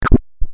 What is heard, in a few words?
Guzzle; spongebob squarepants extreme arcade of world records